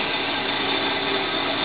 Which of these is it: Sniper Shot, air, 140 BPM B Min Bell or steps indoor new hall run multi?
air